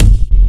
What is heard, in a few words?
Industrial Techno Kick oneshot F; kick drum